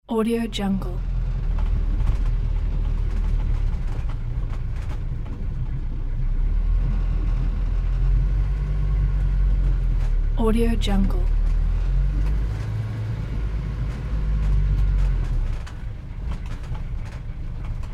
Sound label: old London taxi interior